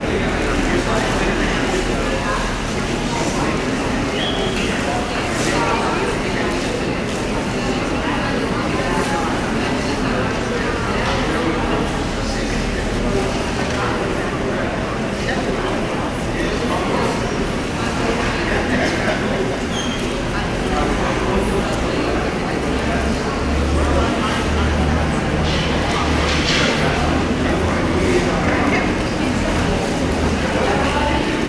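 ambience urban city nyc wall street public space internal; wall st pub spce; sound effects one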